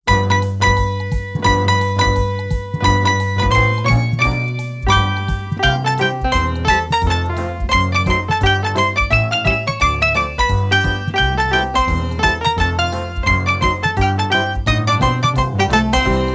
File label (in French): Piano A Pompes